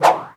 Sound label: Wood Poisonball Throw; gamerip; disney star smash; android; Enemy